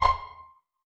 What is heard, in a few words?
PIZZ C4 PP, Orchestra, STR PIZZ; Sound On Sound - Peter Siedleczek's Orchestra